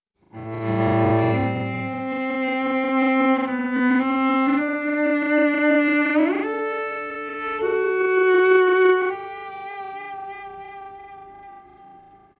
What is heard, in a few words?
Cello